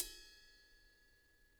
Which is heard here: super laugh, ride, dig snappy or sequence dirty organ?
ride